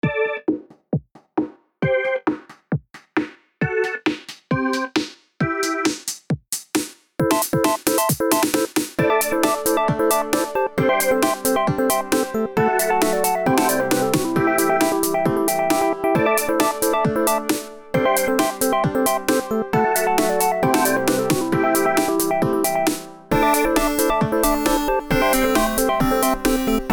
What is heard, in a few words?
minigame loop; Tyke & Sons Lumber Co